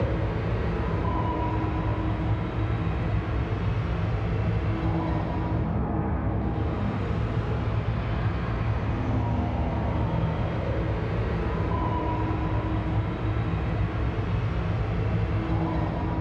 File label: cyb arc furnace phase; Stellaris; machineage; superstructures